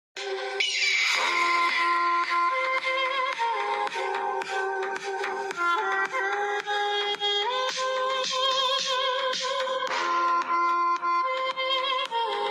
slow reverb ringtone punjabi ringtone lofi remix bgm